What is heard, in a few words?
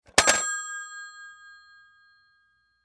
Technology Telephone Rotary Base Slam Down Bell Antique; Telephones; SFX Bible 6